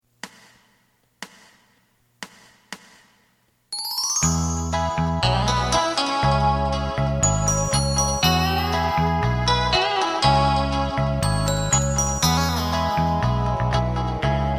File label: drum; エレキバンド用カラオケCD